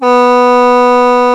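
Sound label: B1 SHENG, CHINESE SHENG; AKAI S6000 CD-ROM - WorldSounds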